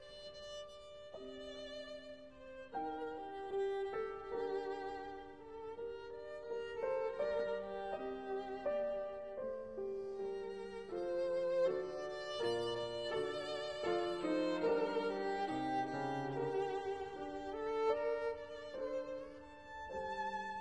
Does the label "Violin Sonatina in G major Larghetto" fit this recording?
Yes